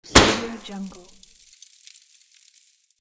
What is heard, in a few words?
petard